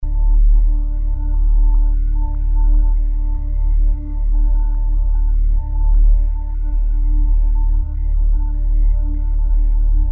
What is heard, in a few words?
Quiet Dread